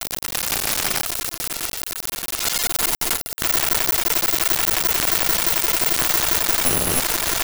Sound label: Rhythm; 99Sounds; Mangling Audio; Data Mosh Loops; More Rhytmic